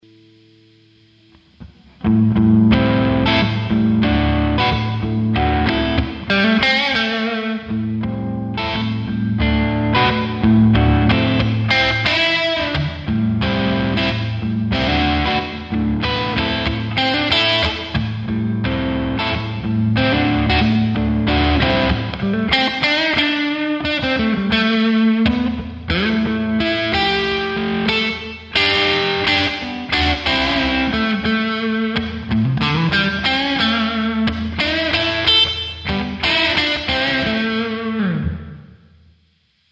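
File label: Mitte Fender OD Blues; humbucker; classic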